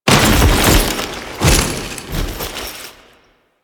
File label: Foley Moa Finisher; Warframe; Kahl